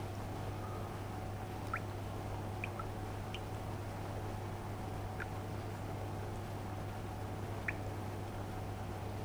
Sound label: Ambient Water; Among Us